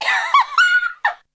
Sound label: Vocal; ZERO G - Datafile DISC 2